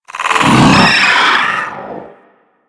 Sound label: Pet Vocal Pain; Enemies; Vocals; Warframe; Alad V Pet